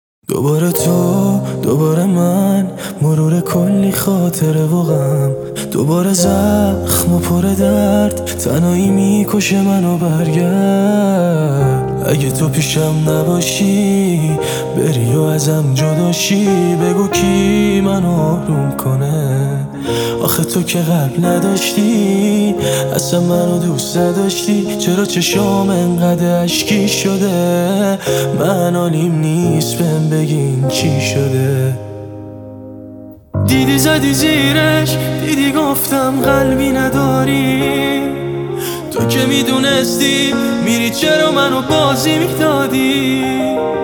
Piano Version

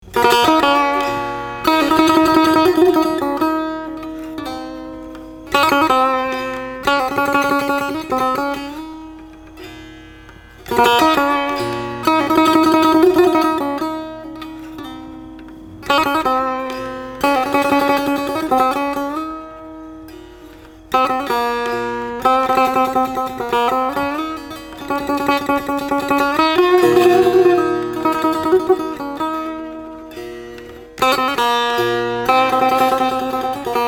Instrumental

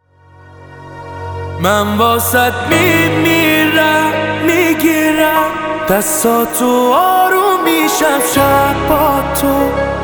Piano Version

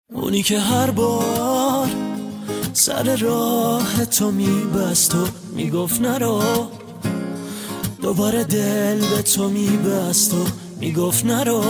Guitar

